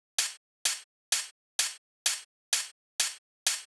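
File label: Drum Loop 128BPM hi-hat; VEC5 Drumloops; VEC5 Multi Loops; Loop Elements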